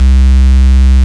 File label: FARF C1, FARFISA